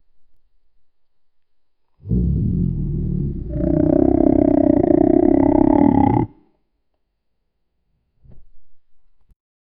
a long lassting wolf

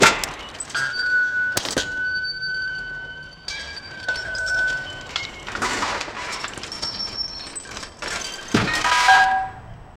add crashing sounds of, robotic

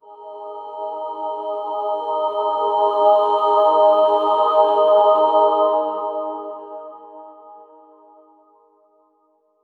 gothic alchemy success, musical

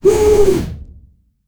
comic fire whoosh zoom

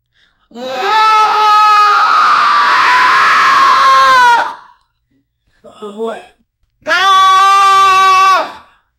torture

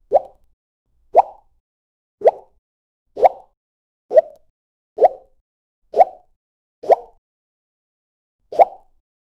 cartoon jelly wobble

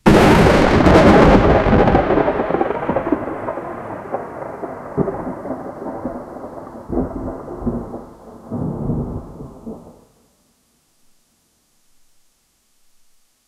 cinematic comic style thu, thunder

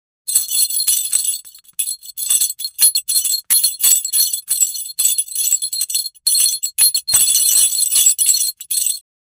gold cha ching sound, money

